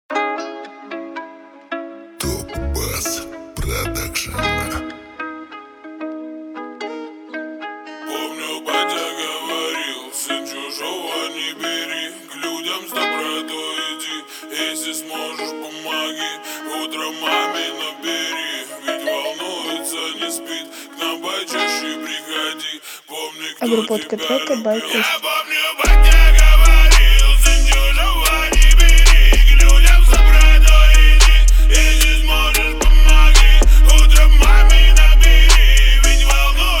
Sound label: 37-41Hz Screwed Low Bass